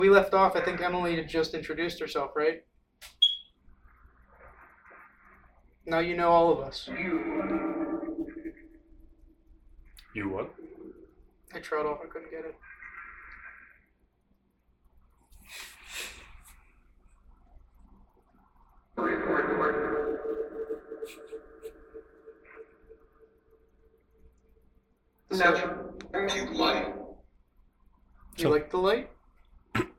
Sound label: Basement Open Room Phasma Box